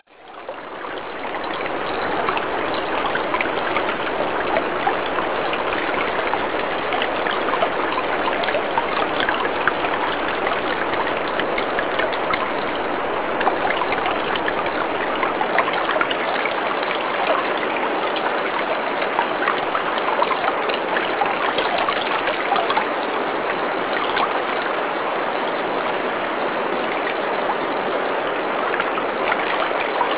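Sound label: torrent; Sensorium; kawasaki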